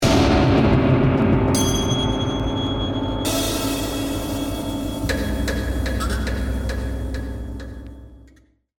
dangerous stab

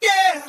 "yeah", sung

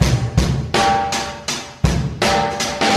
Drum loops, Boombap

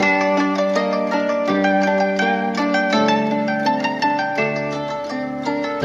keys

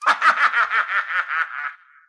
laugh satan

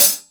Hats, Real Closed

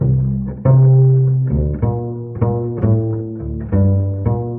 bass

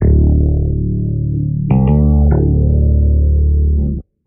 bass, Loops, Phonky

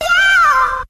"yo" SUNG, Voice; ooh ahh

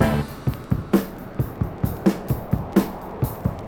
stab an airplane, Drum loops Boombap

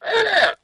erghugh; ooh ahh

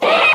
metallic hit

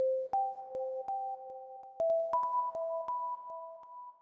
keys, Phonky loops

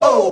"oh", group of men; ooh ahh

